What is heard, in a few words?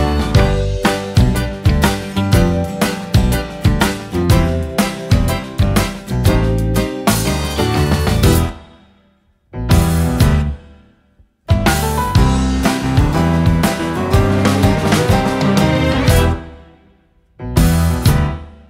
Instrumental